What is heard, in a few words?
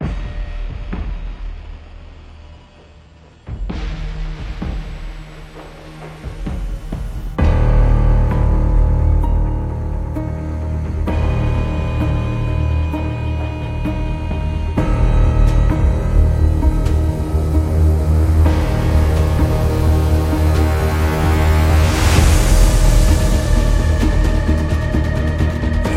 Tension